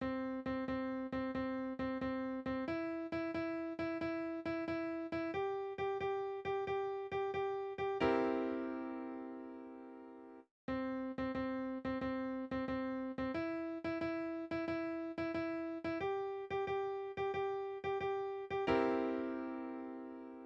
Examples of swung rhythms

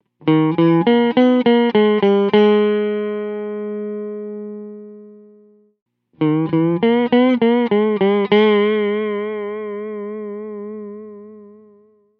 vibrato extreme

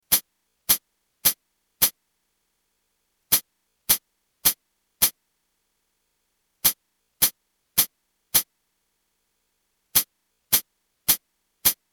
Pedal Hi-Hat 12kHz; frequency training series

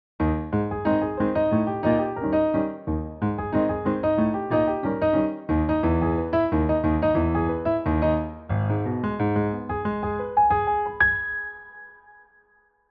Maple Leaf Rag Both Hands

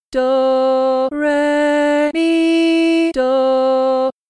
Easy Melody Solfa; Easy Melody 1

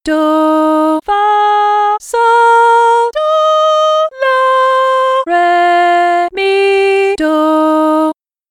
Medium Melody Solfa

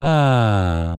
male vocal sweep down

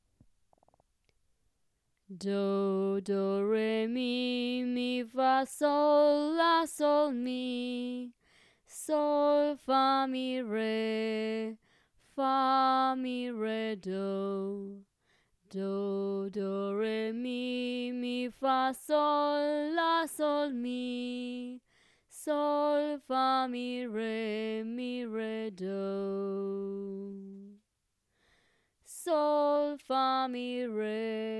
solfa melody

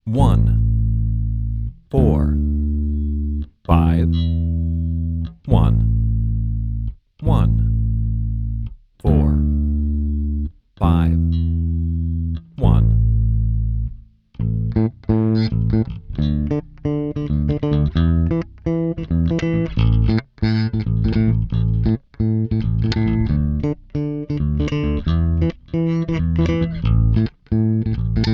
bass solo I IV V I dance A

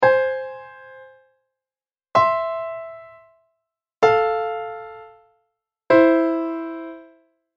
min6 Example; interval

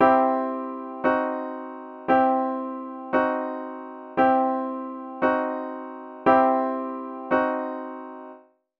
C major vs. C diminished

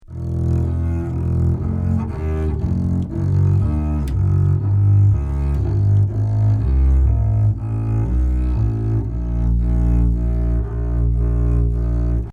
Upright Bass Arco Phrase

